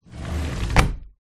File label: household window sliding close